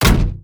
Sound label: tank